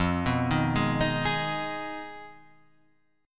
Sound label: G sharp Minor 6th F